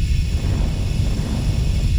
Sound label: trap gas idle, monster